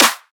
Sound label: Riddim Snare Clap